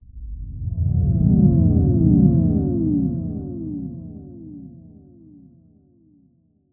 swell chord into descend tonal echo design underwater dreamy; skyclad sound